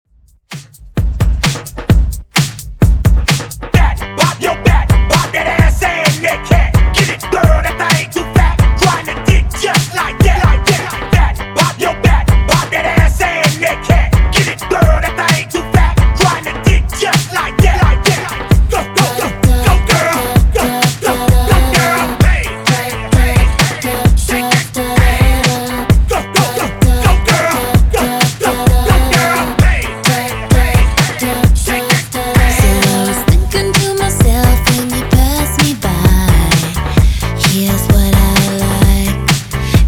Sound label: Hype; Re-drum